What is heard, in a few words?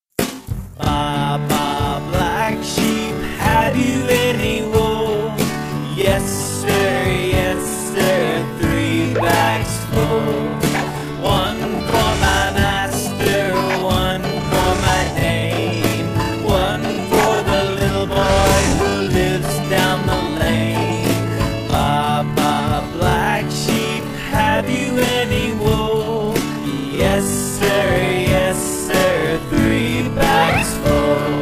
Baa Baa Black Sheep Nursery Rhymes